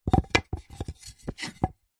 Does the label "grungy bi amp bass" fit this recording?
No